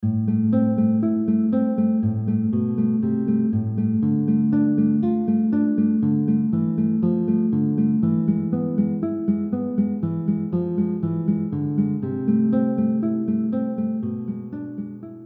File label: guitar; Allegro; giuliani opus 50 no 13